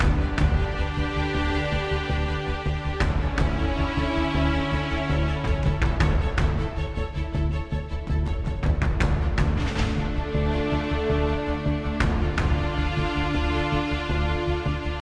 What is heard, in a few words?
Mood Mid